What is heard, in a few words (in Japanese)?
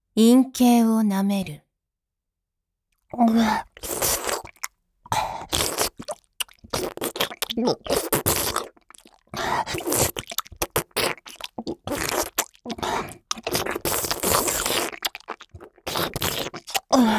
陰茎 舐め; ボイスドラマ; エフェクトあり